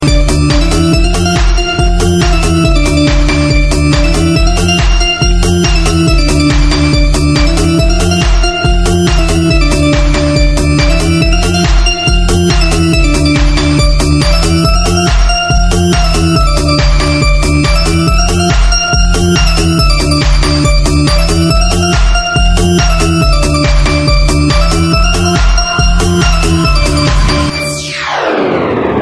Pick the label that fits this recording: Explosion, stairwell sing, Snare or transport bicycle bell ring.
Explosion